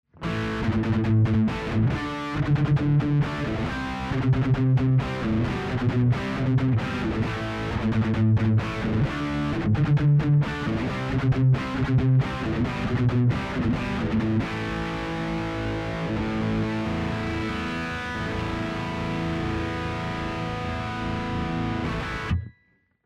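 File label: Heavy Riff DI Balanced Out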